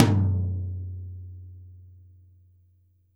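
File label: Jazz kit tom, Drums Acoustic; USB Soundscan vol.10; AKAI; JAZZKIT 2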